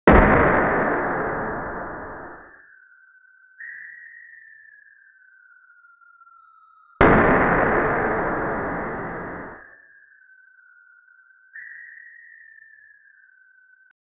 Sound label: dark lightning, chiptune